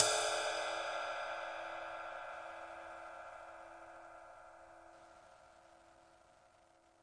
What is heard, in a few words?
big ride; single hits; Big Believable Rides